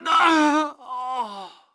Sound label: hit; NWNDS; nwnds audio